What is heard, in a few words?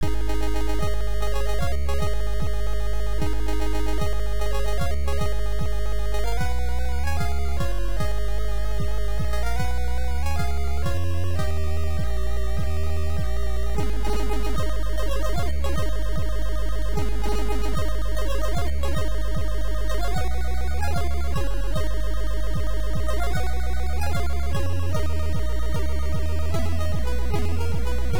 Hard Game Complete; overlord nes